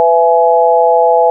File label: 100Hz Doppler harmonic; 11x100HzWQDoppler harmonic2